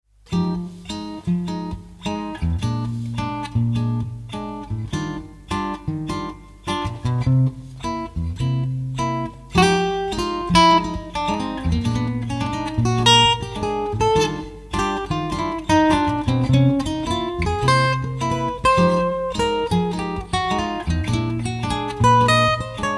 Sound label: Lick in Eb